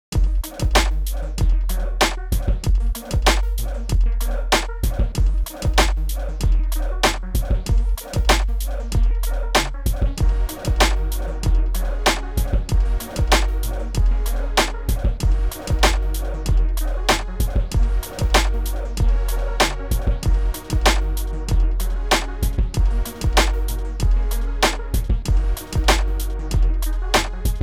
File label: Dirty south